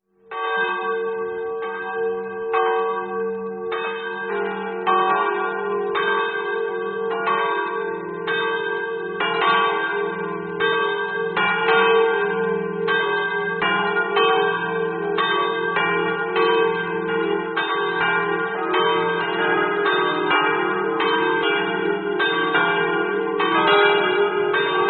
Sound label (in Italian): campane; s rocco